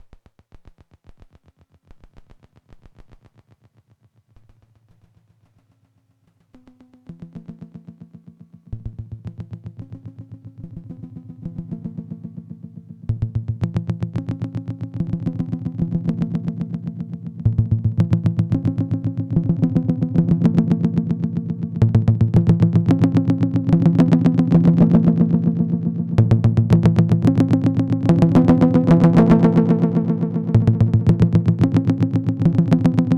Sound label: looping delay parasites